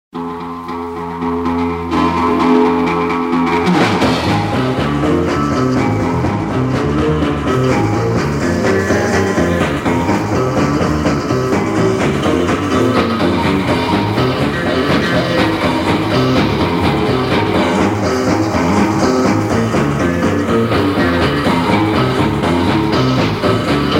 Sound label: guitar boogie